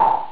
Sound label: click